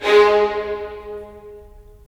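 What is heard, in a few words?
strings violins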